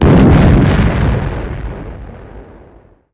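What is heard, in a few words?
weapon grenade compression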